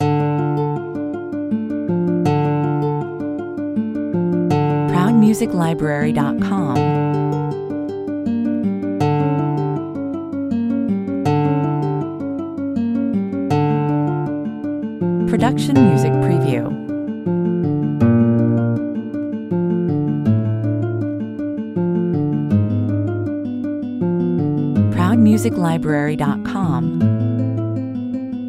prelude for lute, 105 bpm; Johann Sebastian Bach; Das Präludium in c-Moll, BWV 999

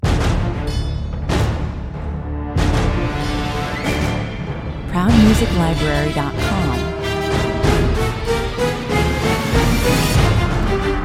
orchestral battle music 112 bpm

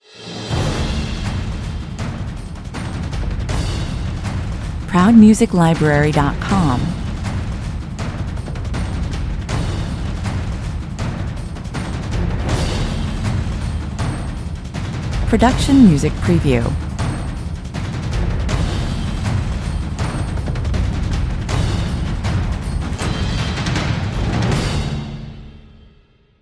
percussion mix

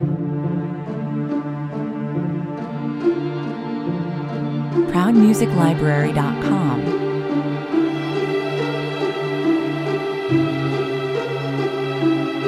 string concerto 2nd movement 140 bpm

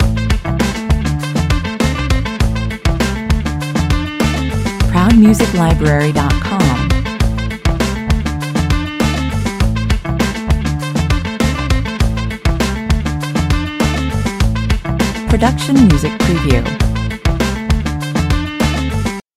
the funk loop

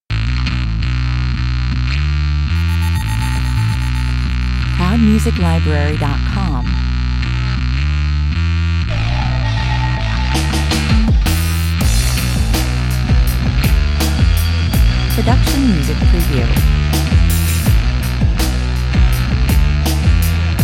no brass version 164 bpm

